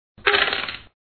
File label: dice sound